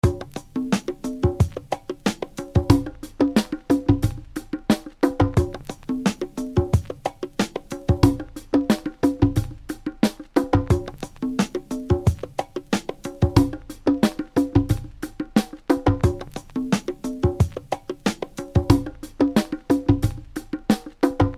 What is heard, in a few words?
beat